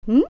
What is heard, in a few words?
Hmm; Nabooru; zelda; OOT